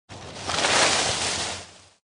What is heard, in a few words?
trees down; plant